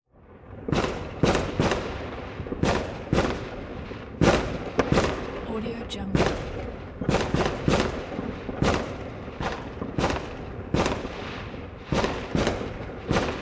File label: fireworks